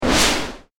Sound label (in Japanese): 強風